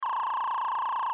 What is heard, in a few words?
Sci Fi Beep Buzz Loop; Tech; Blastwave